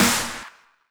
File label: Clap; Vengeance Samples; VEC1 Claps